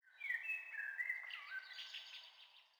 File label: sting songbirds; Rust